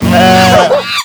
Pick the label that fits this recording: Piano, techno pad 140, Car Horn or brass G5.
Car Horn